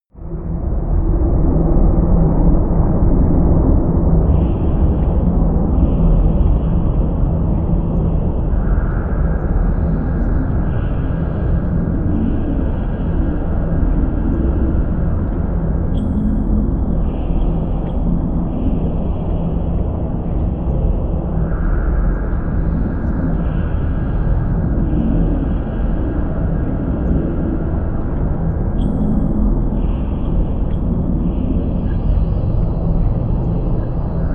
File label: drone swarm